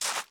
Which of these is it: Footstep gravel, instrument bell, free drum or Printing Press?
Footstep gravel